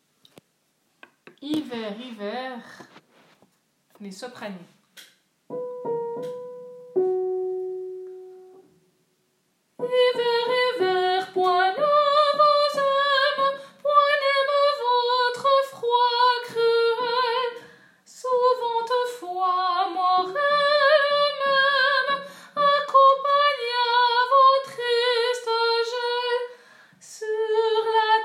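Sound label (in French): Hiver Hiver Sop, soprano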